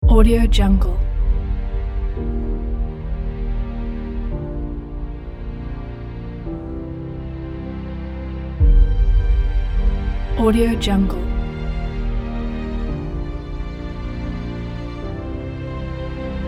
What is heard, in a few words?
emotional epic with vocal; emotional epic with vocal kit